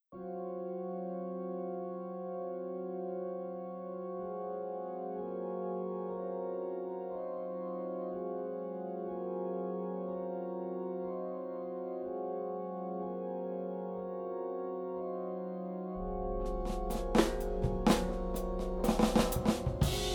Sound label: hymn